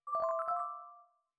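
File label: bell glitch C major; bespoke - Floral v.2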